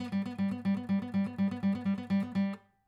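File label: acoustic pattern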